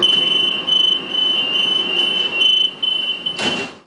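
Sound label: Door Close, Train; R110A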